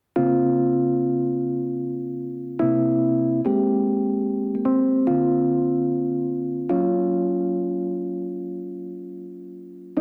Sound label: Waves FADEOUT